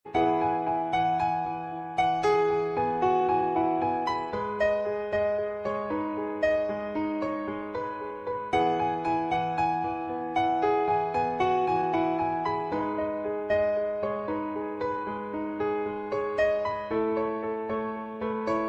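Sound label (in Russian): krasivaya melodiya fortepiano, beautiful piano melody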